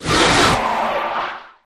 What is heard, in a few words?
Animal Scream Roar, dinosaurs